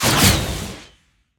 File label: Rocket launch; Likho